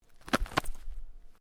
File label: Clothing Jacket Sleeve Flap; Movemement sounds for various articles of clothing; Dressing; Human